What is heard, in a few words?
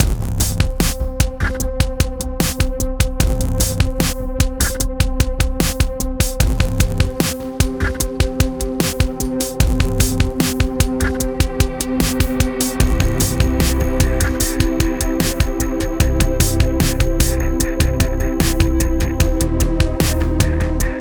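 Music Industrial, Industrial Loading 75bpm; Forza Motorsport 6 Apex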